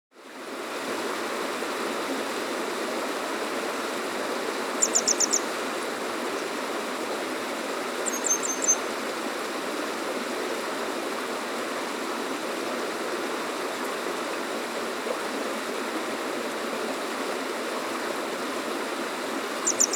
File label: Grey Wagtail